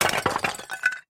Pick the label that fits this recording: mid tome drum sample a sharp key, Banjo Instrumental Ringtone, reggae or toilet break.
toilet break